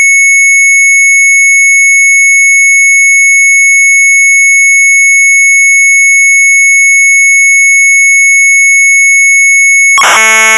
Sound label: MZ800 Tape; CMT WELK CIJFER; sharp; retromelsarchive